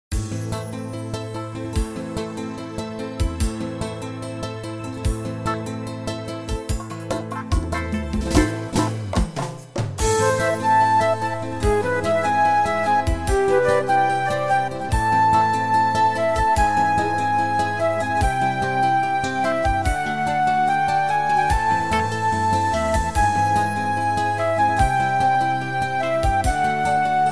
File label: Melody